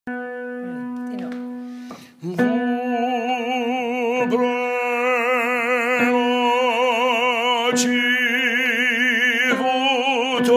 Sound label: tenor